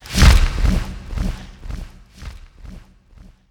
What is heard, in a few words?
Bahro Wings